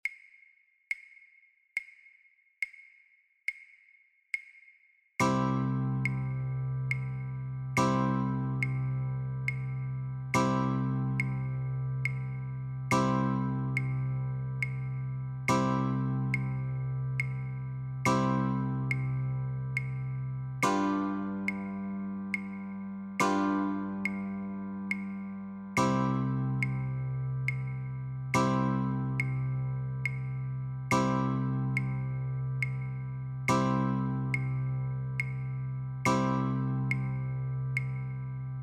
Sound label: Chords, guitar